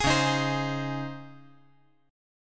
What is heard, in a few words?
Db Major 7th Suspended 2nd Db; 13,13,11,15; DbM7sus2 strummed; JGuitar